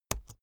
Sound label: keyboard laptop enter press slow hard